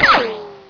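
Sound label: ricochet, weapons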